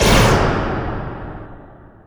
flyer shot, ambience; Quake 4 Alpha

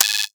beep large; Quake 4 Alpha; id software; strogg

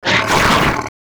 imp attack melee, monsters; DoomRTX; imp5

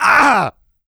pain large, player; DoomRTX; big

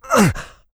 pain light, player; small

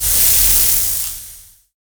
ice pneu; Quake 4 Alpha

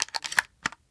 Shotgun Load Shell; Foley; DoomRTX

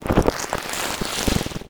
imp chatter, monsters; Doom

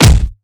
punch; Quake 4 Alpha; strogg tik

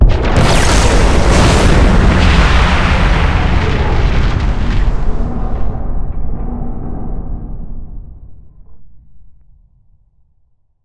explode; Quake 4 Alpha

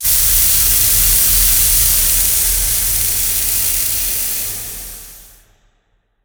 ice pneu; Quake 4 Alpha; id software

